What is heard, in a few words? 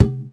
bouncy beachball hit; bouncy objects; Tony Hawk's Project 8; XBOX